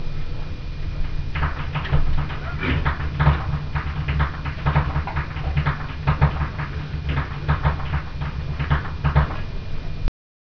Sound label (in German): schnalzen, jugend; Surheim